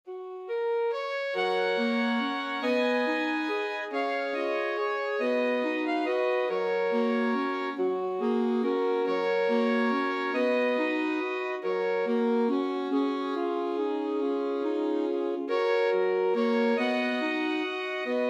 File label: morning has broken, alto sax quartet